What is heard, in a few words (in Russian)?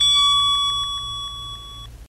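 игрушечное пианино настроено ти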